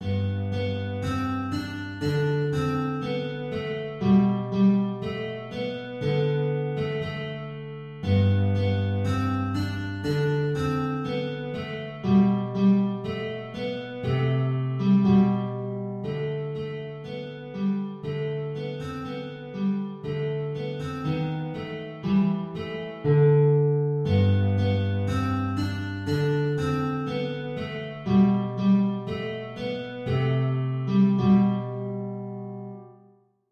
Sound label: guitar